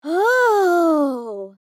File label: Female amazed reaction